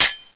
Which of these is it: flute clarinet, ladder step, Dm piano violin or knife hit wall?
knife hit wall